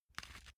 small pepper grinder soft quick

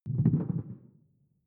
sci-fi alien bubble; swooshes-swishes

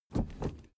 suv idle 1st gear change

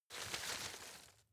grab rustle short soft; berufe; foerster

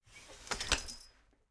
folding chair unfold slow; objekte; stuhl

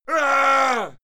male fight scream; schreie; menschen; soundpool

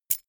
key ring mid size catch; objekte; schluessel

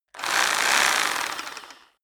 rock drill hammer rev double; bohrer; soundpool

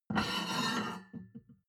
plate on plate take slide soft, restaurant cafe

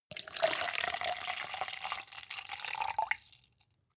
cup plastic pour bottle; industrie wirtschaft; gastronomie

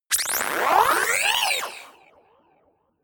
crackle scanner short; controller; soundpool

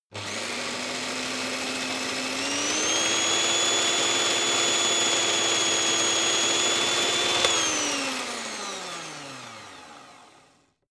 electric whisk spin high; haus-garten; kueche

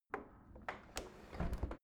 window handle close soft; objekte; fenster

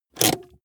car bmw e46 interior handbrake pull up fast

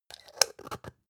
spice jar scratch close; industrie wirtschaft; gastronomie

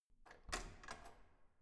corridor door open normal

